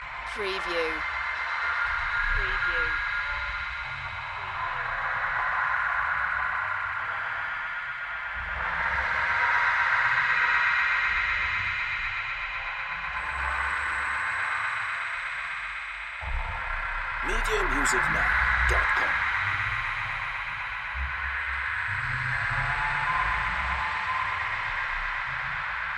ghostly shimmer ambience loop

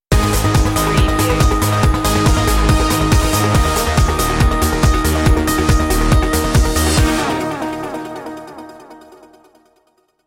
mystic stinger

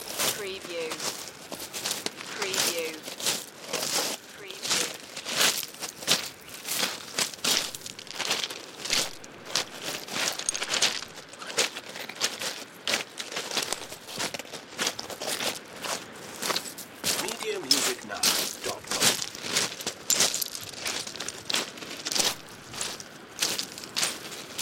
Footsteps on Rocks

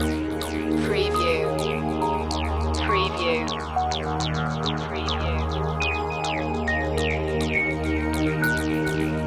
mountain fog loop

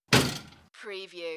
Door Mosquito Net Slam and Rattle

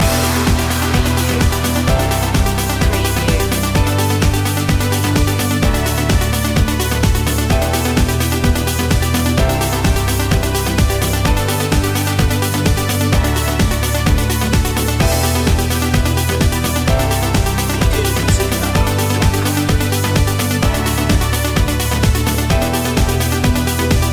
surf loop

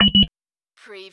Servo Panel Sound - Keypad

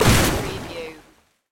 Magical Water Elemental Attack

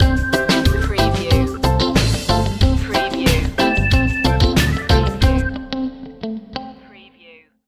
reggae simmons stinger

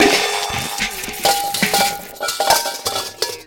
CARTOON MULTI CLANG

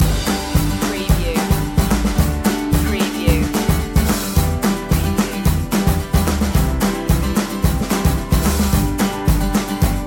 hay picking loop

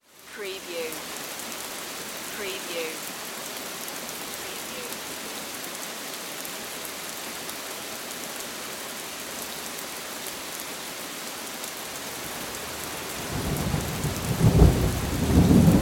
Weather Thunderstorm

